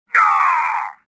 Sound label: choke; Star Wars Jedi Knight II Jedi Outcast; XBOX; shadow